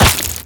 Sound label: meaty deaths